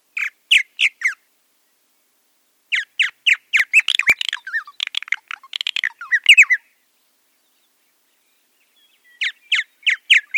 Purple Martin

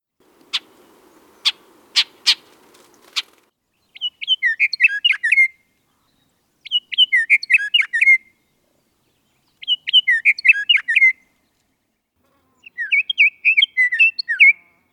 Scotts Oriole

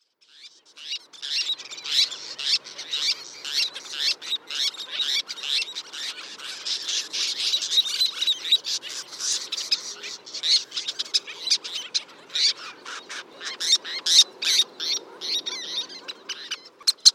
Least Tern

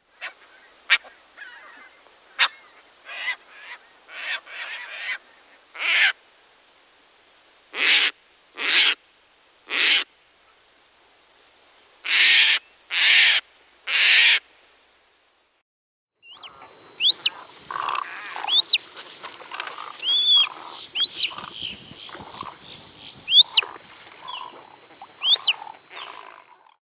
Caspian Tern

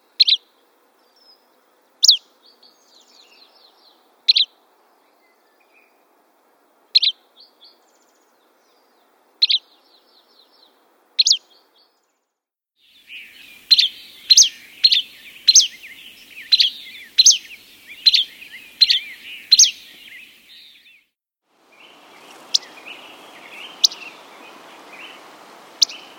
Buff breasted Flycatcher

